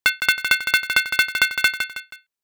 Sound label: stir